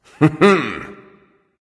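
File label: non-verbal heh heh; smite; voicepack; houyi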